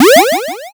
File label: jump